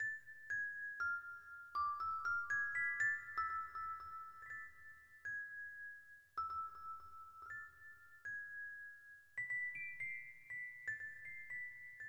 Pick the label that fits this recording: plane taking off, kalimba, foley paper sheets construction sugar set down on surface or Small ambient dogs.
kalimba